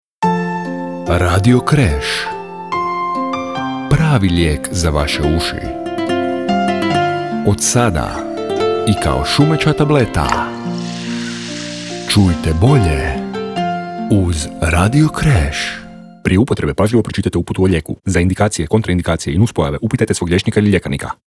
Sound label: Jingle; Radio Crash